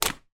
household mains plug uk type pull plug out of wall socket